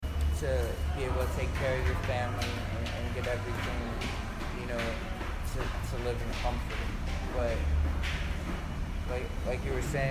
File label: subway